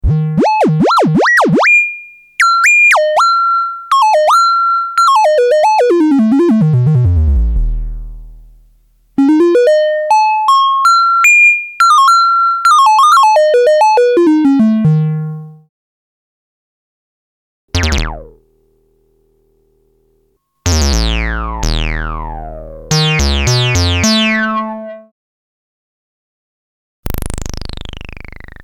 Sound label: EMS Logik